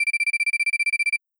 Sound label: ringtone beep loop